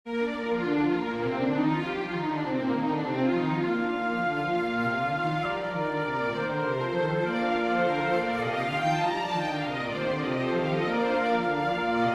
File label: for winds, strings; Baal monarch the skies; Deborah